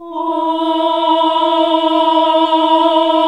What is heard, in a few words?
OHH E2, classic vox